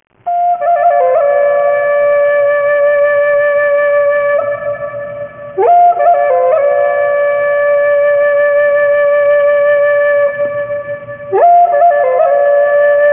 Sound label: shaman call flute